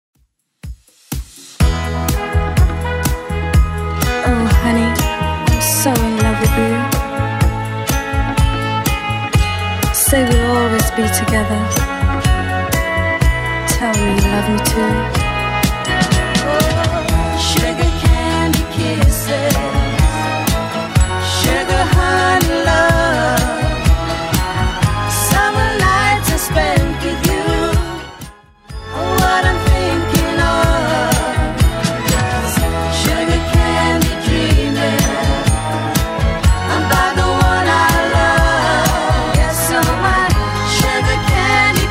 70s Redrum 124bpm

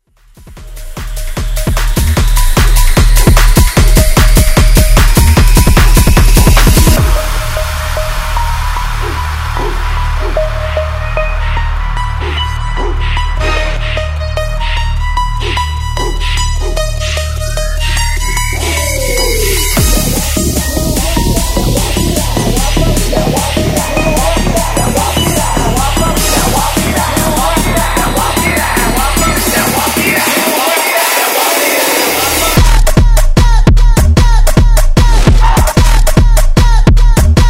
Bass Drop

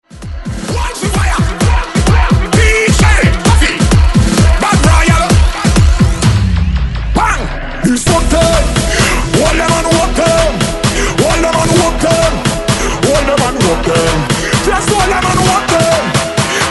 BREAKMIX; DJDOLLS

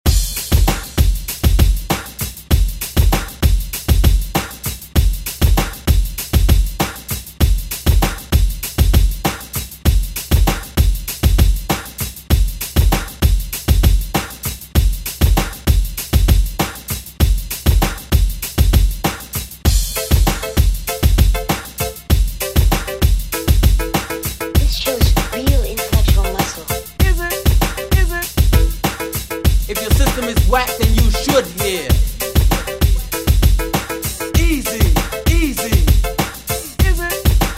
80s ReDrum; DJ Mhark

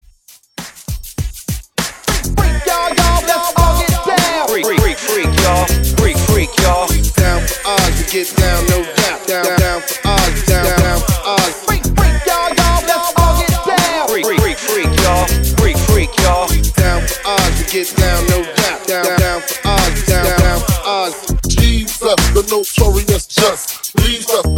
Party Breakz Intro 100 BPM

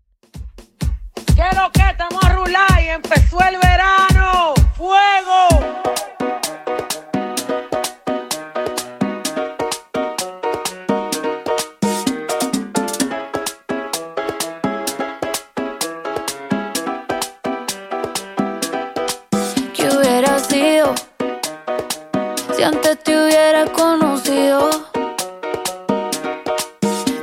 Top40 Redrum 128bpm

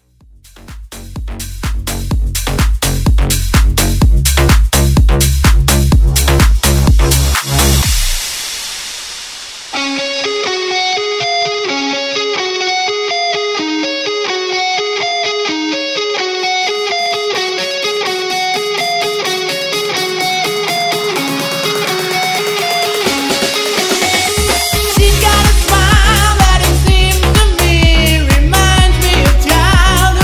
Vocal House Edit

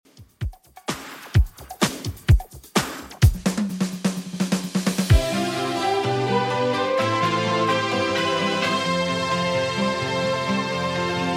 80s Redrum, 128 bpm

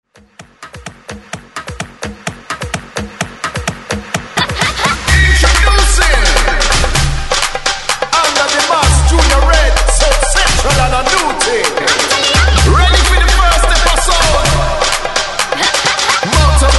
party, 130 bpm break mix; DJDOLLS

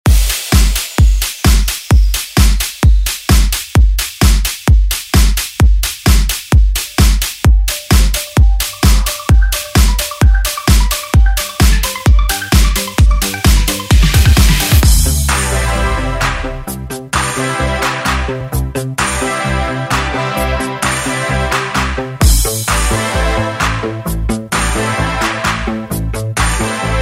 Oldschool; Deejay Hit; Redrum

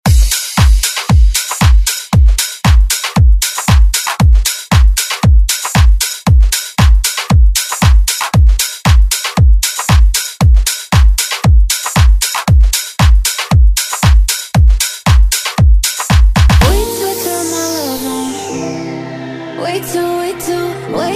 House Redrum Intro

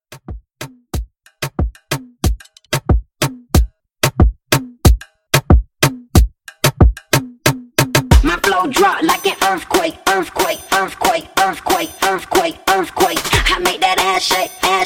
Moombahton Trap Redrum; Remixfreakz

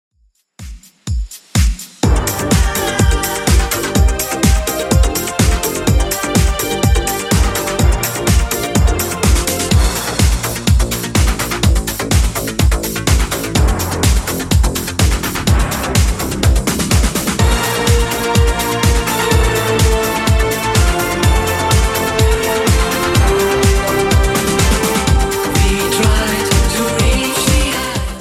80s Redrum 125bpm

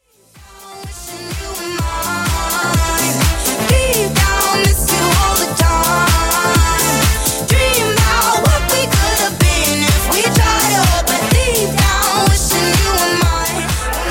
Dance Club House Mixes, 126Bpm

